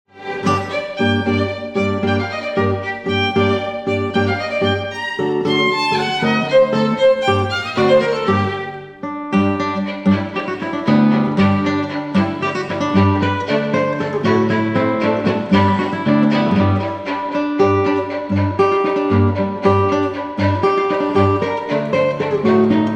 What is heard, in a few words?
tango